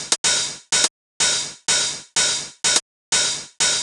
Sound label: drum tech hat 125, Drum Loops, Classic Chicago House